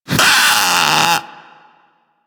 Infested Splitter Split Top Vocal Pain

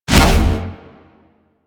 roulette jam burst; Warframe; Cowgirl

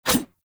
Large Dagger Whoosh Heavy; Weapons; Enemy; Daggers

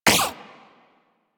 Shot, Weapons; Warframe; Moa Pet; Tazicor

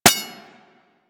Bullet Impact Generic Metal; Surface

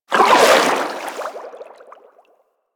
Sharkwing Melee Whoosh; Warframe; Vehicles

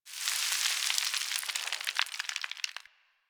Landscape Dust Scatter, Ambience; Warframe; Venus Open World; One Offs

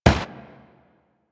Walk Dirt, Footsteps; Warframe; Excalibur

